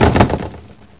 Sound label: ceiling tile break, plaster; physics